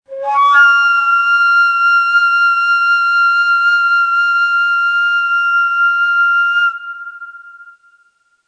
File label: Tiz Beyati, Neysample